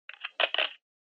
Handheld Transceiver Noise Short

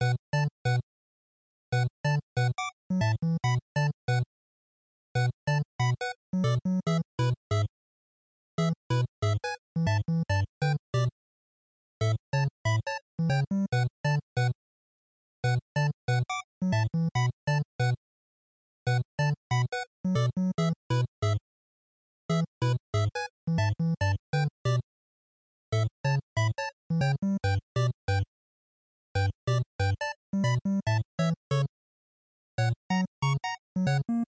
NES RPG Battle Loop140

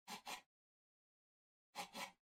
PC Mouse Move Far Short

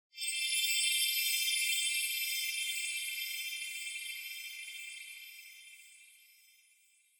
Onoma Sparkle Long

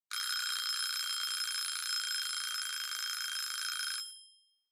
Kitchen Timer Alarm Long

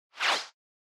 Motion Agility Short

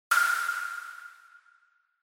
Single Accent Mid

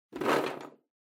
Drawing Box, Hand In

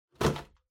Drawing Box Put

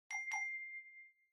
Smartphone UI Sound Speaker

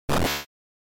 GB RPG Attack

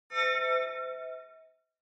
Horror Accent High Short

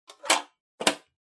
Pencil Case Close

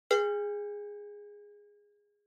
Cowbell (Dry)

